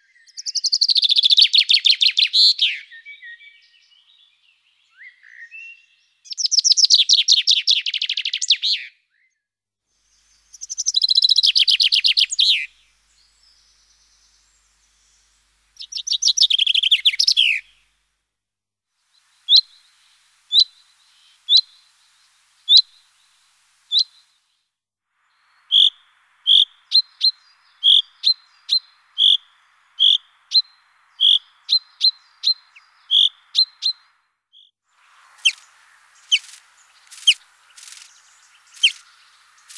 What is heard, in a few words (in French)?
Pinson des arbres